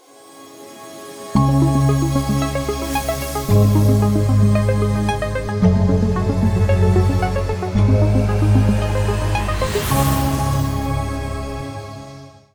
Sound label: Tech Trance; Asus; ROG-Phone-6